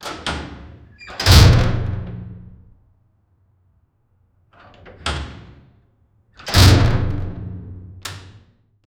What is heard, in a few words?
heavy metallic door; creak; long